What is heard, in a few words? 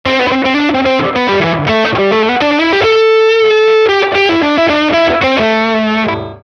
Solo Rough Solo Melt, Guitar Effects Pedals; Pro Co